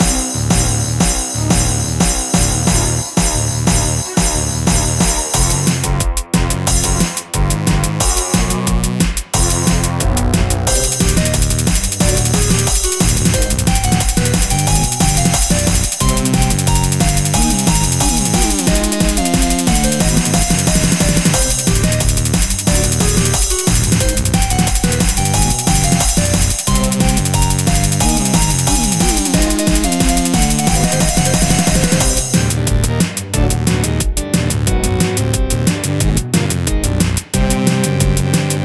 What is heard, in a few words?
Boss Fight